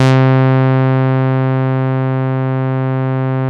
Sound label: RAW SAW, BASSES; Masterbits - Vintage Voltage; VV BASSES